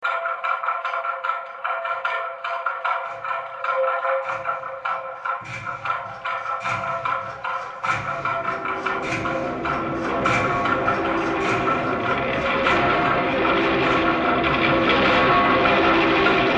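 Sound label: Factory Ambience; F.E.A.R.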